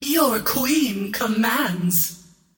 attack whip; queenofpain

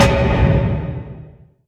shield block; weapons; dota; mars

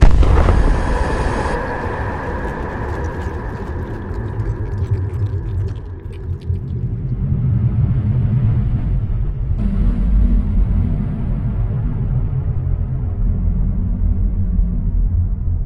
portal spawn; underlord

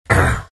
pain, vo; arc warden

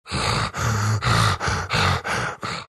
breathing; bloodseeker

